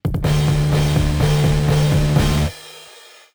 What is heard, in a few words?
lose round; A Sound Plan